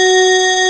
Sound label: mini bell; ST-XX Amiga Soundtracker Sample Packs